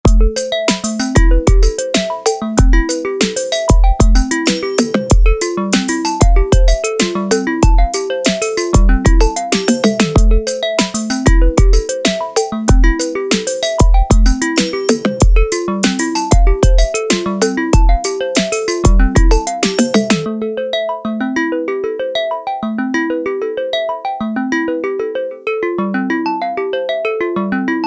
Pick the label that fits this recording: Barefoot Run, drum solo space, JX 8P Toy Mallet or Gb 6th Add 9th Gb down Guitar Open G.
JX 8P Toy Mallet